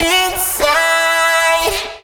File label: phrase E, Vocal; Slate Digital Omnivox Vocal Sample Pack